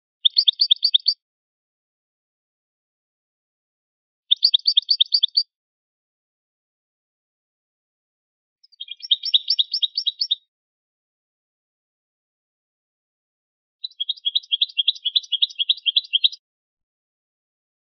selección campanilleos; campero; capi capi

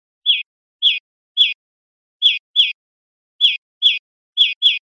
golpes sueltos, verderon

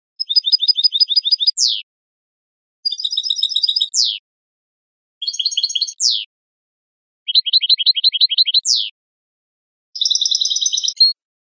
repertorio cantadas basicas rematadas, campero

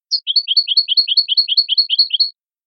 boli

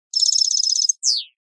timbrada; campero

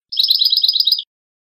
cascabeleo fino; campero

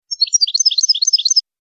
floreo chavi chavi; campero

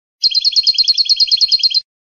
bibleos golpeados finos; campero

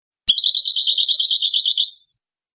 cascabeleo golpeado, campero

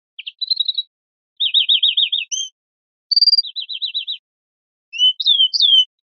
faltas pirreos, verderon

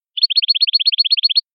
campanilleo

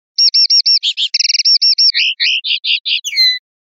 cante arrebatado

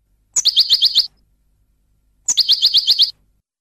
padreos corridos; campero

